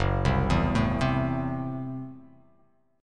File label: Gb Minor 7th Flat 5th, guitar chord; arpeggiated; JGuitar